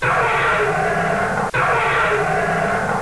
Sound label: dragon roar